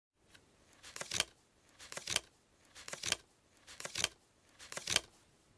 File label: Casino card; کارت کازینو